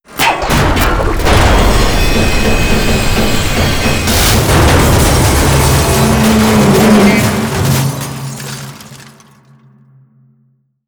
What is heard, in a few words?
finale destruction; animation sfx; Portal 2; playonce level